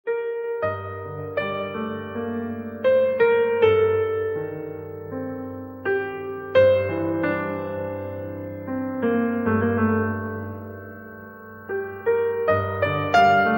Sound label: Noire Piano